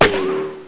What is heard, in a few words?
empty Gun; Jungle Rumble